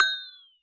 glock fx; Univers Sons - Raricussions

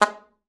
SLPA G#3, ALTOSAX; Xsample VOL-2

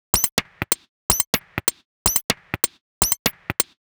Top 125bpm, House Drumloops; 90 s Sample CDs; Zero-G House Fabrik Drumloops; ZG Drumloops

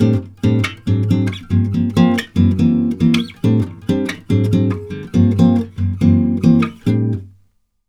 CHORDS, Brazil Bossa; USB Soundscan vol.16

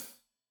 Hat Loose, Hi-Hat, Cymbals Stereo

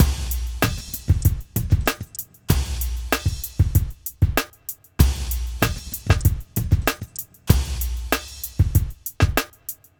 Drums, Hip Hop

